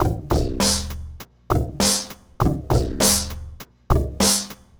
Remixed Drums 100BPM; The Roots Drumloops

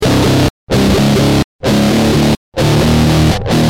G Jam, Guitar; Industrial

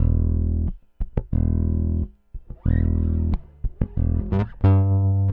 Rock, Bass; Bass X

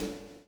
PULL, Backbeat; Spectrasonics - Backbeat

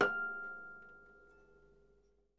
H F6, Percussion & Harp; Miroslav Vitous

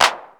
808-Style drum hits; FM 57 - The Ultimate Sample Collection (1997)

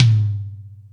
Toms 1, drum machine

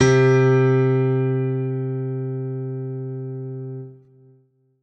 M1 Piano C4; Big Fish Audio; Old Skool House; OSH Piano

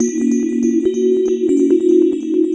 POLY BEL 94 BP, RHYTHM LOOPS; Rarefaction; Poke in The Ear With A Sharp Stick

